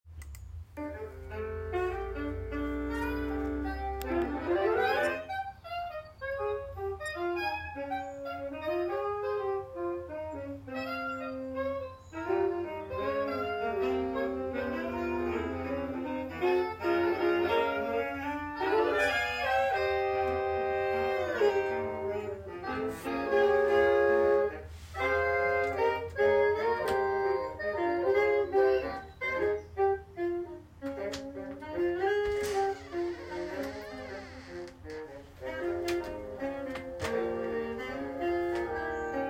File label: Saxophone Quartet